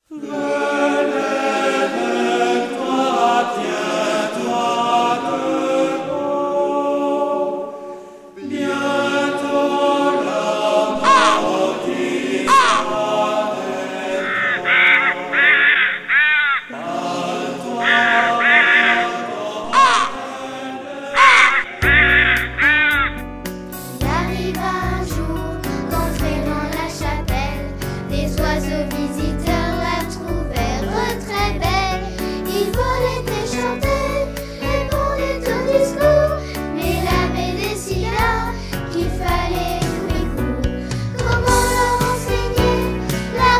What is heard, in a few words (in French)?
Les oiseaux